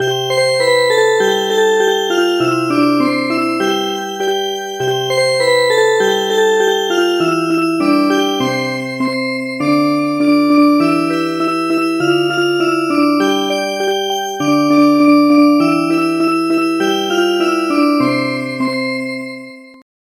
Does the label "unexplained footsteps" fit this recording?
No